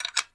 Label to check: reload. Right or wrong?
Right